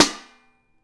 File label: armour hit; AoM-ARTofMyth